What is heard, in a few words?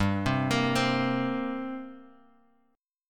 G Minor Double Flat 5th, G; Gmbb5 Chord {3 3 X 3 1 X} arpeggiated